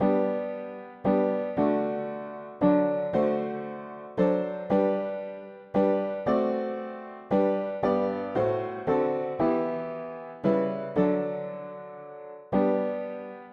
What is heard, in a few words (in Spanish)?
mono piano; hymns; Himnario pequeno